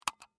Mouse Click Wireless, Technology, Mouse, Click, Wireless, Laptop, Mouse; Computer Sound Effects; BlastWave